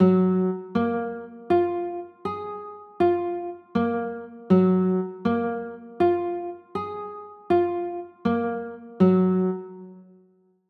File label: g flat maj9, chords